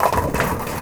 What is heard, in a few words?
strike, bowling; game bowling; Leenkx Examples